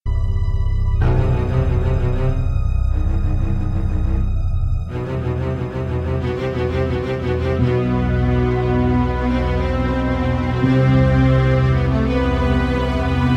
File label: suspense